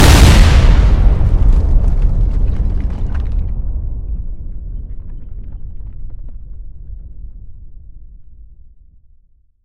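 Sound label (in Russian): оглушительный взрыв на поле боя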